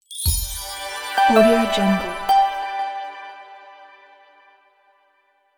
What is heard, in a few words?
mini corporate logo